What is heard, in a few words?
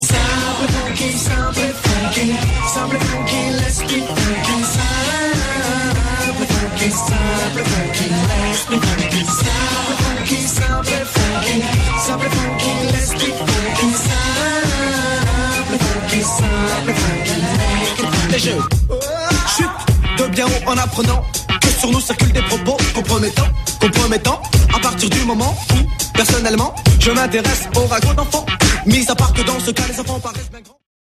Funky